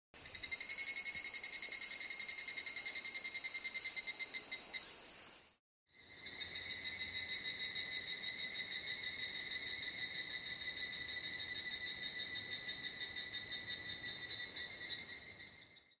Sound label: kana kana